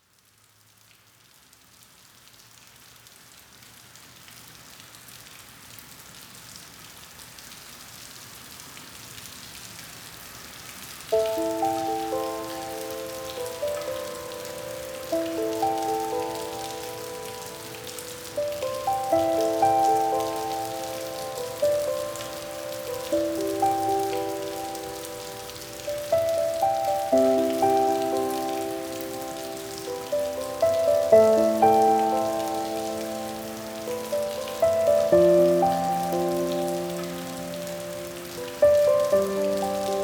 Musical Rain

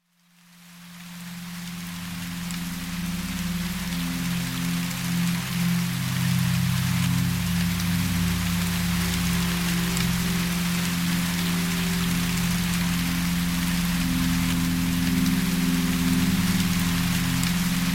174hz Solfeggio Frequency Soft Rain Soundscape

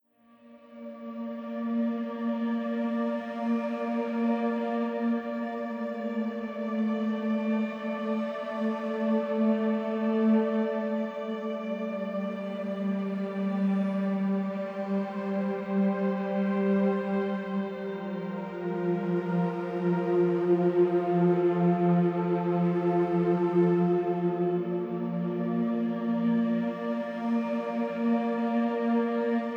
Cat Music Therapy

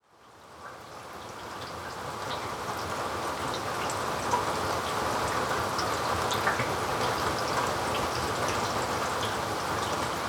Rain Sounds

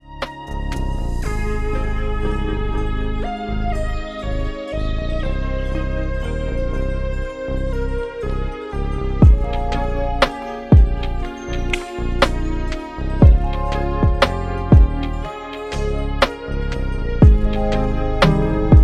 Chill Hip-Hop Beats, Lofi

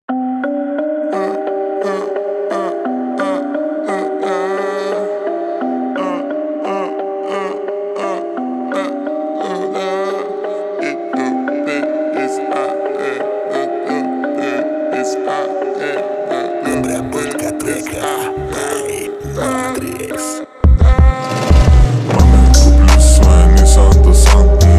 52-58Hz Screwed Low Bass

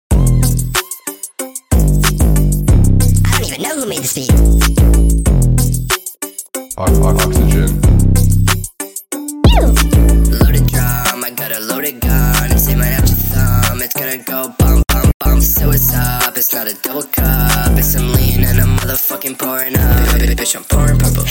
phaser

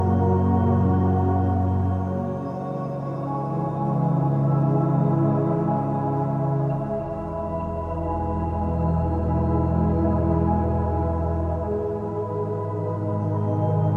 Beautiful Orchestral Classical Music

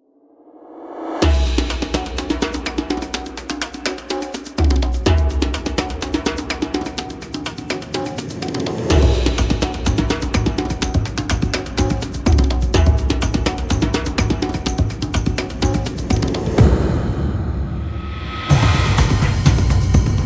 Percussion

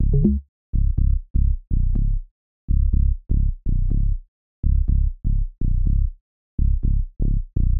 Bass

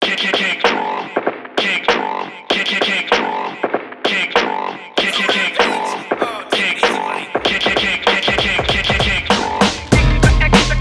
Kick drum

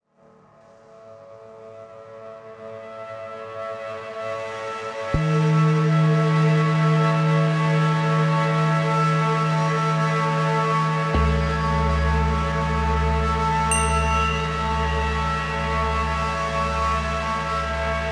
Positive Home like Ambient

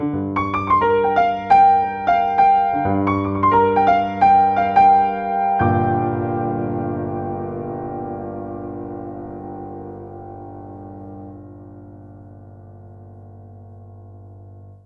Lobby Piano

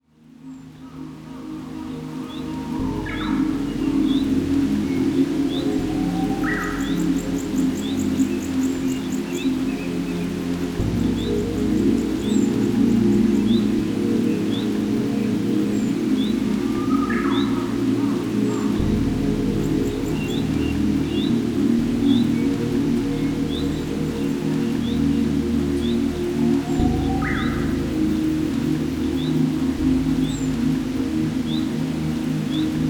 Uguisu Flying Over a River

